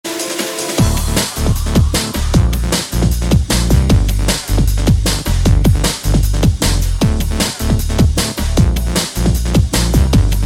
Push Through 154 BPM

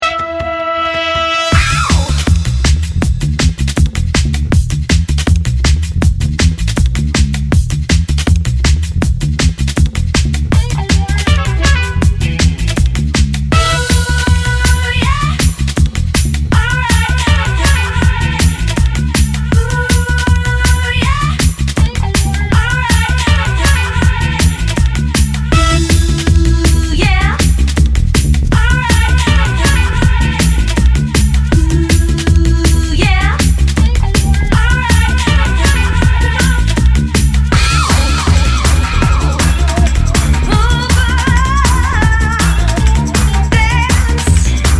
Move Alright 160 BPM

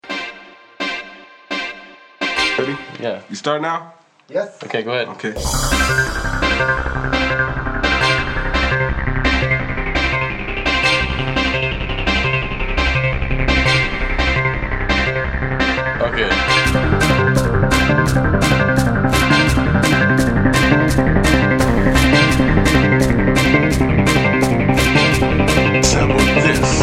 170 BPM

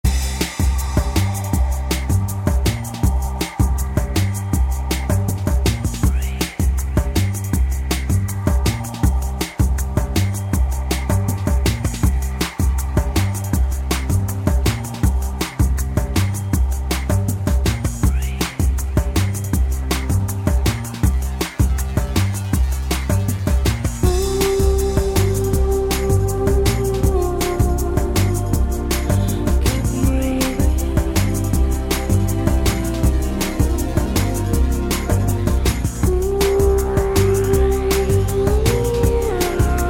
Keep Movin 155 BPM

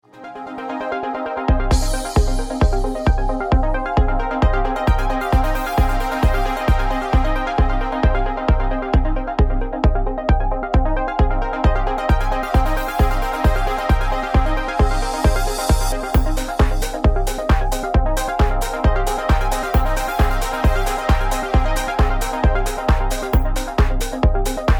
Physical Beats 130-140 BPM

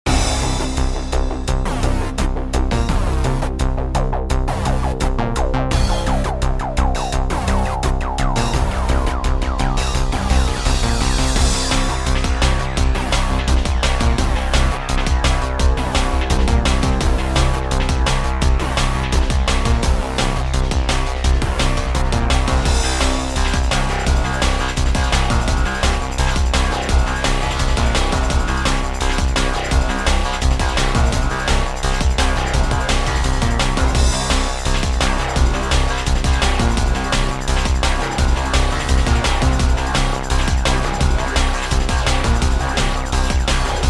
170 BPM

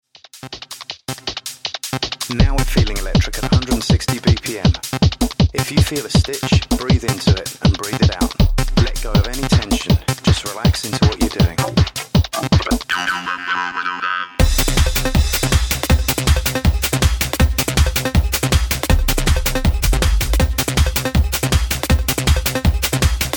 Electrique remix 160 BPM

